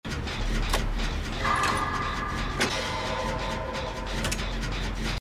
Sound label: skill success; Dead by Daylight